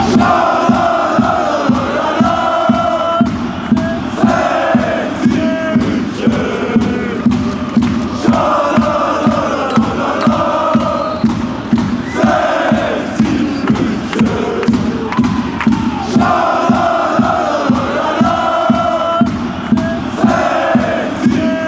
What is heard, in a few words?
tralala slow version; 60 munchen